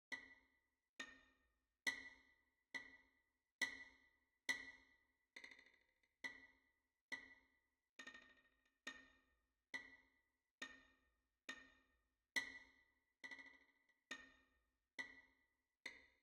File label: constant vln I; devolution